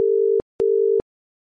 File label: ringback